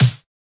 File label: melbourne bounce snare small; Hip Hop; STYLE KITS; D3ron Melbourne Bounce Kit